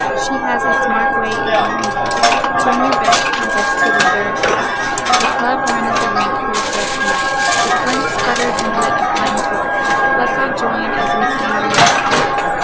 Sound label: casino